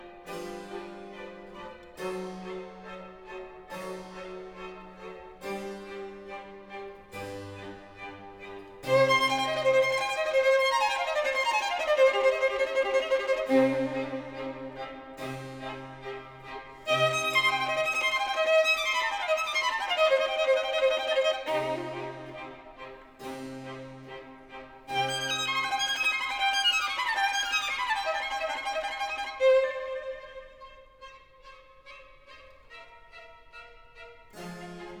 Violin Concerto; The Four Seasons

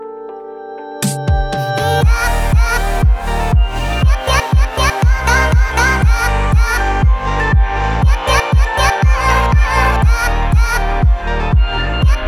Fortepiano Remix Live

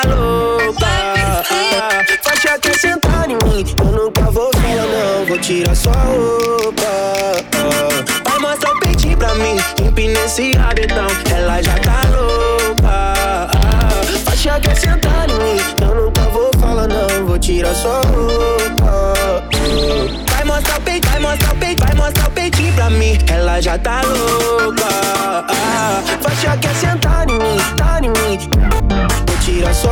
Brega Funk Remix

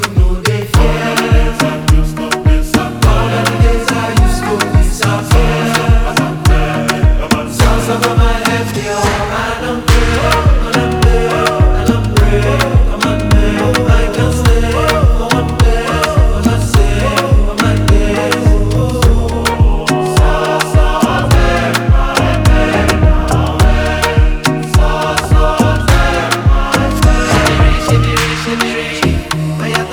Oriental Choir

